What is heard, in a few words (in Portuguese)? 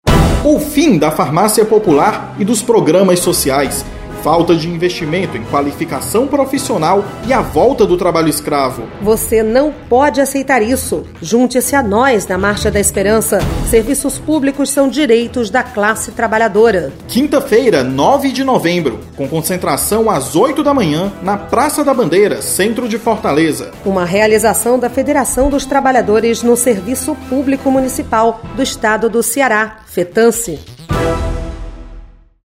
Spot Marcha